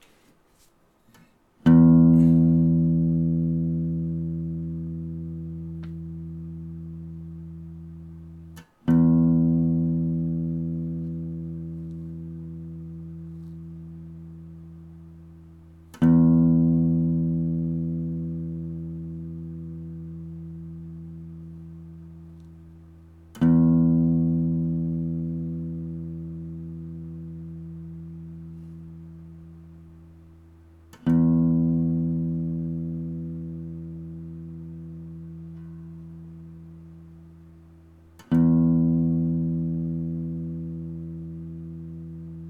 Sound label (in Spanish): Sonido de guitarra acústica cuerda 6 Mi E; afinar una guitarra